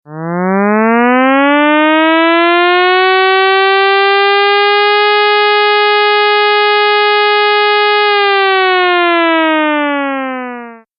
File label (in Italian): sirena